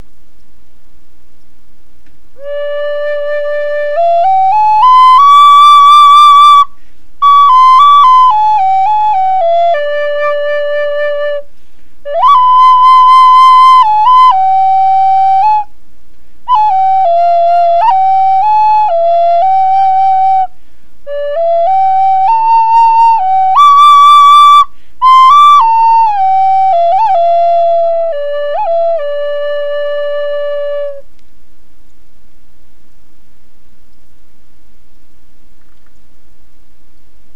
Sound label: D Hi 95, flutes